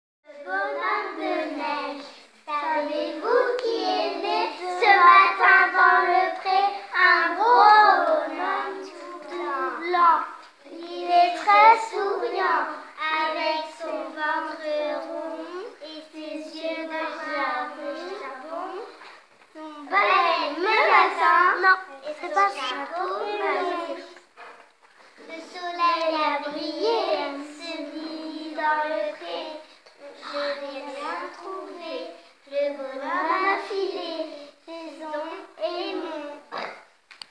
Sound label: Vocal